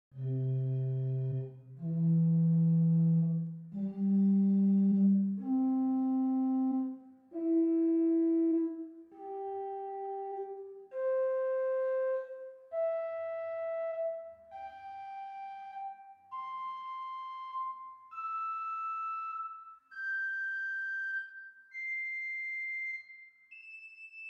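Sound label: Great Clear Flute 4 arpeggio; St Annes Moseley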